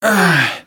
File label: voice male hit